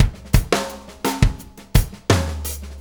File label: Drum Tools, Loops; No Small Feat; Sonic Foundry (Sony Creative Software); Various Loops